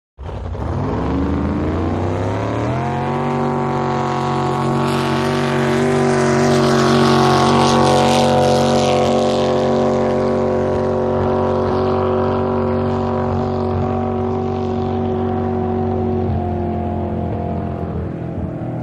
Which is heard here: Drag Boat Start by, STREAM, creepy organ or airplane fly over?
Drag Boat Start by